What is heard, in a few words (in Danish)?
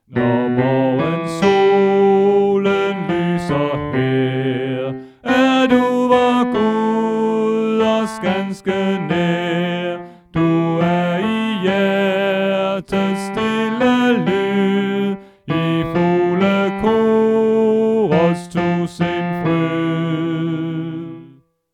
Tenor; oevefiler